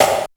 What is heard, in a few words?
SD NOIZ, snare drum; SNAREDRUMS